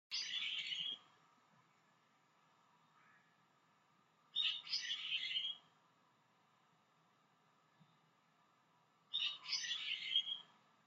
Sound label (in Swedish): japansk bulbyl